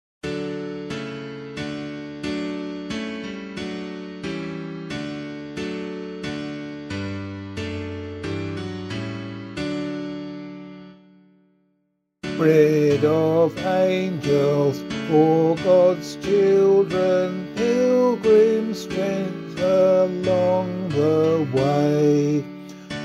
vocal, hymn

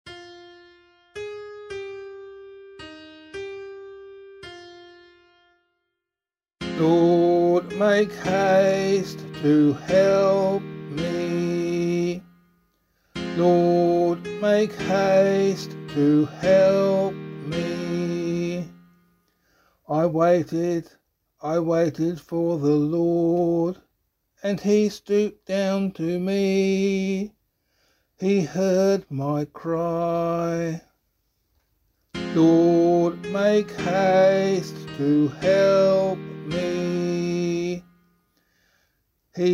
Psalm C vocal

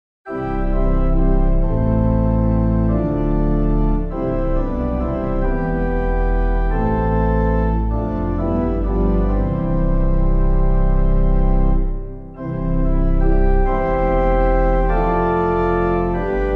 organ, hymn; Baptized in Water Sealed by the Spirit; Saward; BUNESSAN; Clyde McLennan

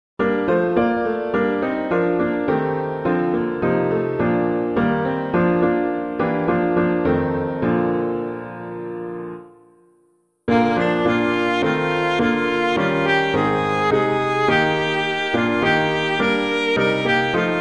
The Church of Christ Cannot Be Bound, piano

